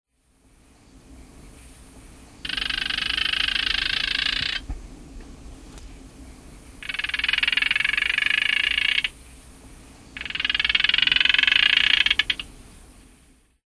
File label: Gephyromantis silvanus